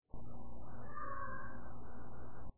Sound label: Ambient Laugh